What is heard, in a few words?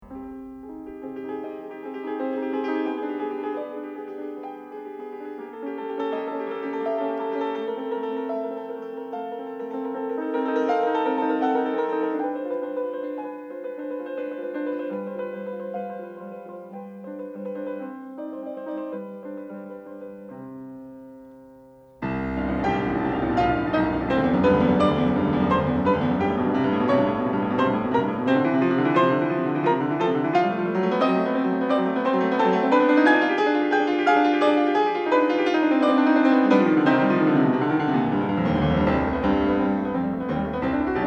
Variations For Piano